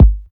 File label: high quality dope rap kick drum one shot g sharp key